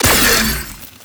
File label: Shot, Weapons, Sentinel; Warframe; Verglas Prime